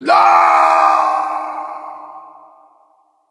falling, player

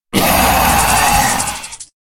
death, player

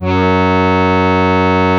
tuba loopnote

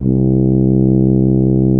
tuba loopnote

